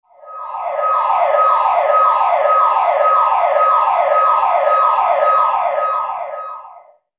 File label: burglar alarm